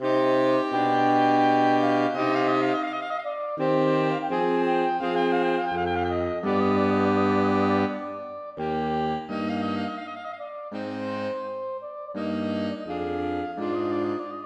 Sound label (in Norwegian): Air for saksofonkvartett; Hertz